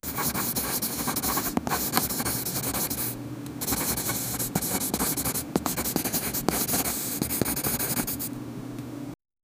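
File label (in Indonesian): suara tulisan di atas kertas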